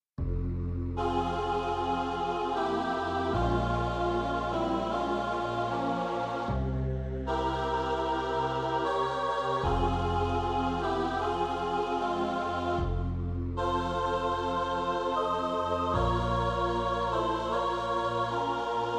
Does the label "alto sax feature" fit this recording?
Yes